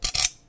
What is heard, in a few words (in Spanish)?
golpearon